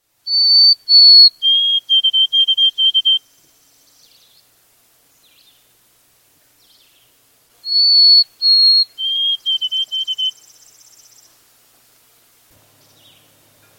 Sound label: white throated sparrow